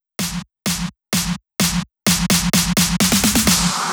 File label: Drum Loop 128BPM SN; Loop Elements; VEC5; Drumloops; Multi Loops